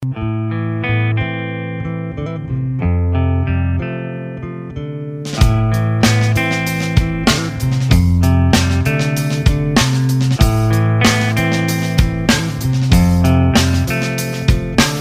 entertaining rock romantic music tone ringtone

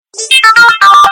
oppo find x5 pro sms ringtone

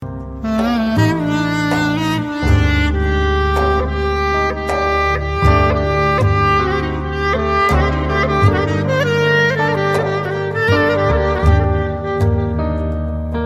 sad music ringtone

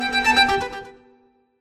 nada dering sms ringtone

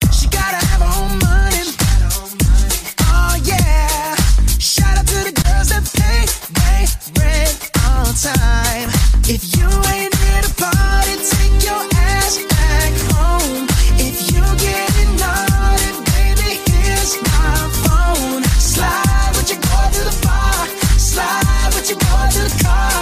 remix ringtone

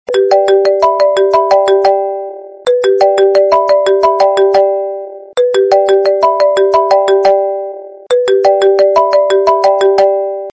iphone message tone ringtone

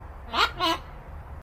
parrot knock knock ringtone

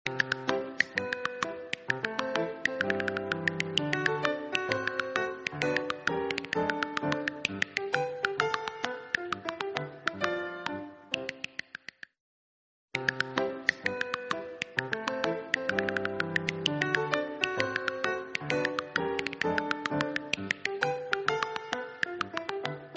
nice background music tone ringtone; nokia ringtones

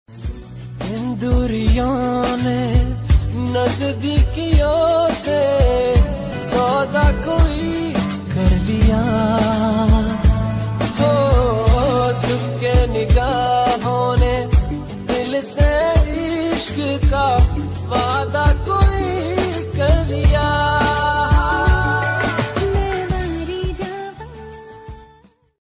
romantic bollywood

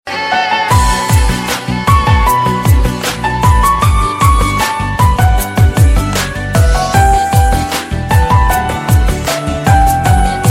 tones ringtone

